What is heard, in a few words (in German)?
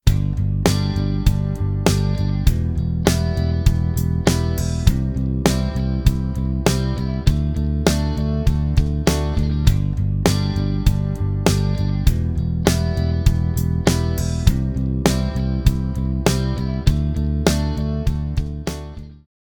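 akkordverbindung dur i vim iv v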